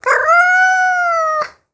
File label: Meow Cat